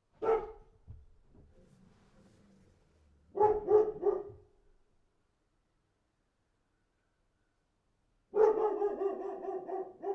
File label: dog barking